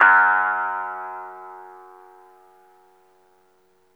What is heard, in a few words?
BASS, F#2; Zero-G; Chemical Beats